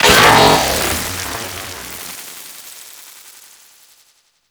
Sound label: Microwave Pistol Death Burst; Grineer